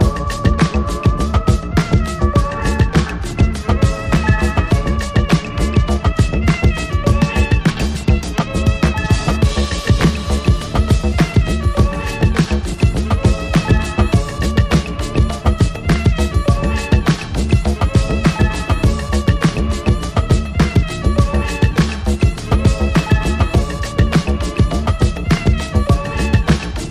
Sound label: VIOLIN MADNESS; ACID